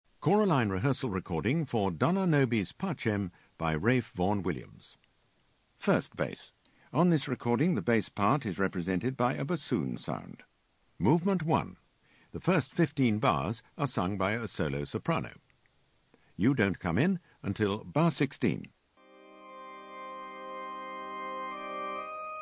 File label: Bass; Vn Wllms Dona Nobis